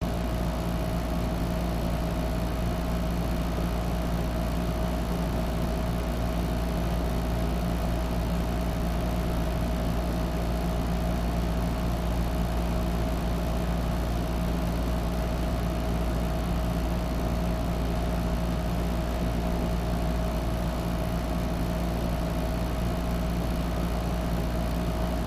Air Conditioner Wall Unit; Runs Steady, Old, Interior Perspective